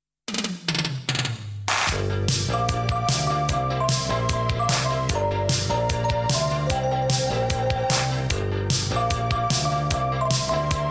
Jingle

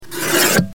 Cutter

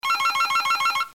Ring

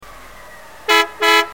Truck horn

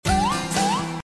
Waawaa

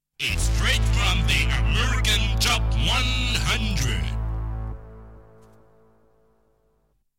Jingle

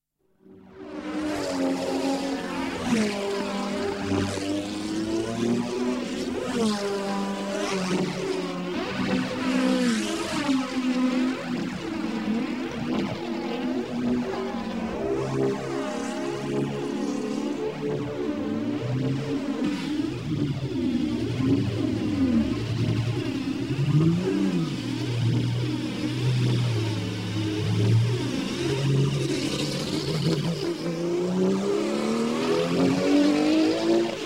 Jingle

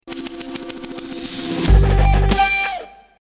Jingle

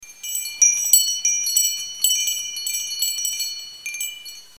Windchime

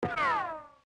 Ric gun

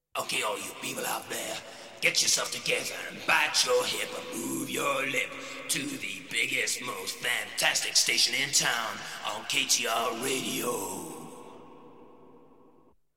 Jingle

